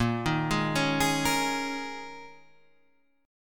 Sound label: G sharp Suspended 2nd Suspended 4th, guitar chord